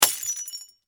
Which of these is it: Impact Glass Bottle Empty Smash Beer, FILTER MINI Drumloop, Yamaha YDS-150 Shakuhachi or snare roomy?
Impact Glass Bottle Empty Smash Beer